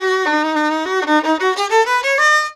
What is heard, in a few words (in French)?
VIOLON, ArabianTraditions; USB Soundscan vol.43; AKAI; VIOLRIFF3